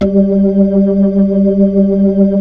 B-3

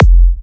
F, kicks; markus hakala kicks

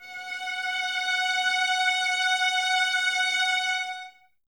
F#5, 11 Violins, Soft; Miroslav Vitous

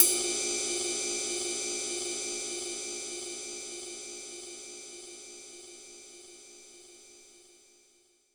Ride, Roland JV-2080

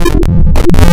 Misc Artificial, wavetables; Architecture 32

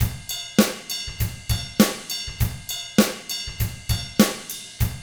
ROCK, Drums; ILIO; Double Platinum Drums 1

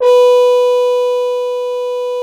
FR HORN B3, French horns; Greytsounds VOL-1

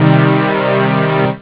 saw min; jazz jackrabbit 2; M8

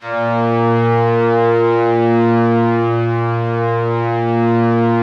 A#1, STRINGS, Yamaha VP-1; Music Store; JODYSTRINGS

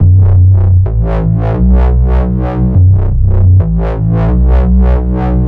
D&B Bass Loop; neuro

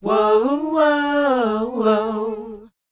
WHOA A, Vocal, R&B & Dance; ILIO; Vocal Planet

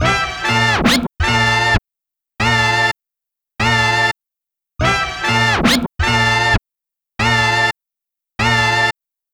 Turntable Sample, Trip-Hop Loops & Samples; Sonic Foundry (Sony Creative Software) - Rondo Brothers Trip-Hop Loops & Samples; El Corazon

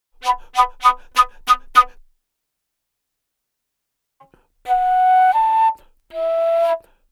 Wood Flute, Asia

